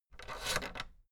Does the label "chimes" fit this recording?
No